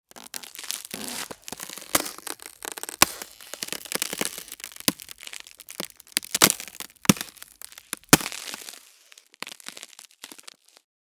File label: Ice crunch and crack